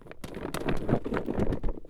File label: Rolling Bin of Plastic; BB Retail Therapy Sample Pack